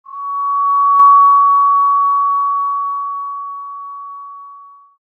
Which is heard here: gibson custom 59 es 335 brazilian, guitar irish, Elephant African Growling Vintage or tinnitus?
tinnitus